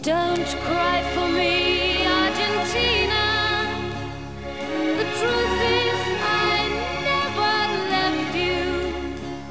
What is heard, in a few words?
cry